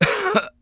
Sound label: cough, English Voice; The Sum of All Fears